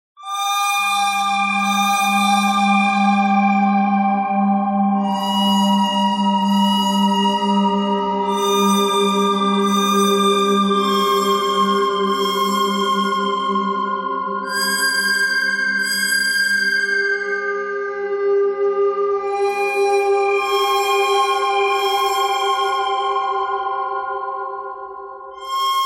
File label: bird thread harmonic